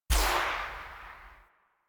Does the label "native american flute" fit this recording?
No